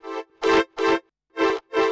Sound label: SYNTHPAD HOUSE 125 A; eJay; Sphere